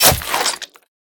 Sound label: sabre hit; Items hit